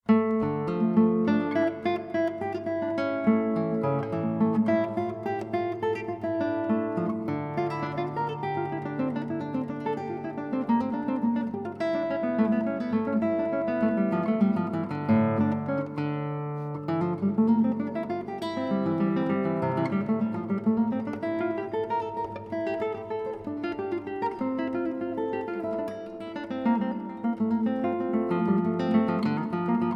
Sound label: Giga, Guitar Version; Johann Sebastian Bach